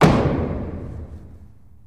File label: Gavel Sngl Hit Slow